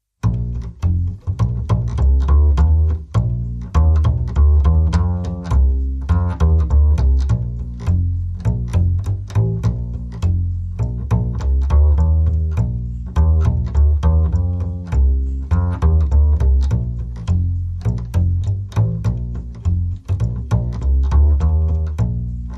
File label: Down Home Bass